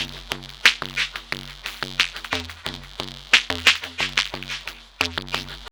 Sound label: WAH; E-MU Producer Series Vol. 6 – Analogia Project